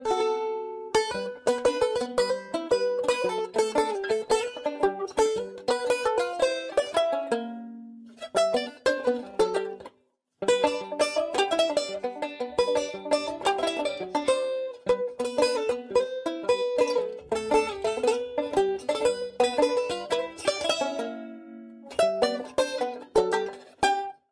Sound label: Banjo